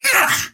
pain big

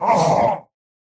pain big; Slork

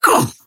pain big

